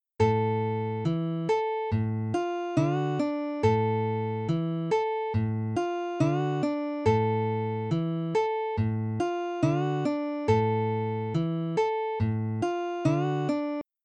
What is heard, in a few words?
Slide